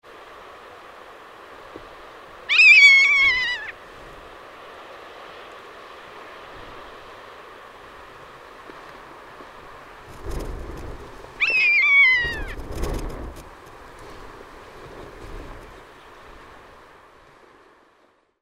rough legged buzzard

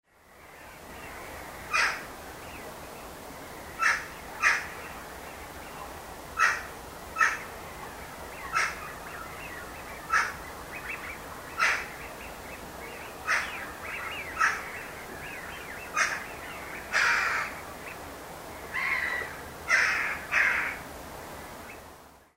jackdaw nocmig

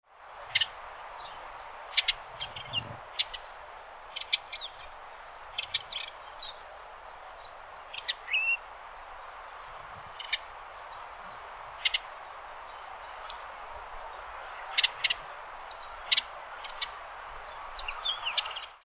tristrams warbler

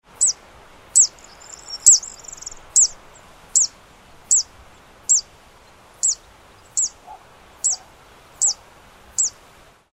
zitting cisticola

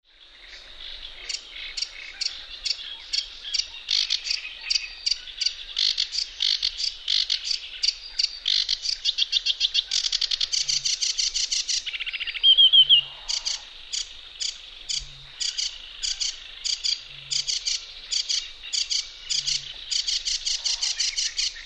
reed warbler

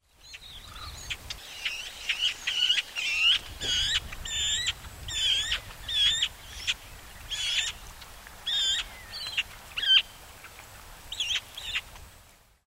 magpie

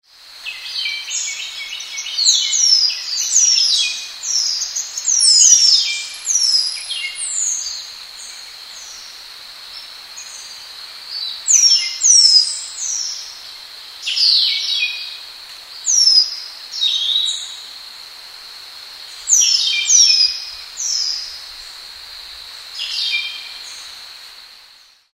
striated starling

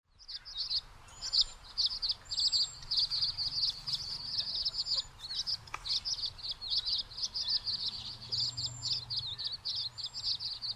tree swallow

